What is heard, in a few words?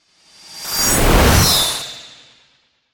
Whoosh Complex; Whoosh, Chopper, Twirling, Object, Production Element, Imaging Element, Accent, Transition